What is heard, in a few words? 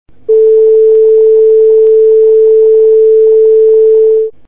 Fundamental; fundamental tone